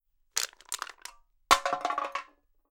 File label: Aluminum Can Crush, Throw